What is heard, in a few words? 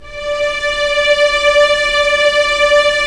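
STR SC, strings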